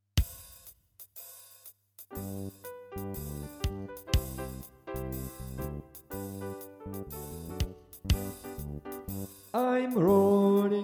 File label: soprano